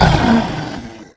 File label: fall, fire man, monster; metin2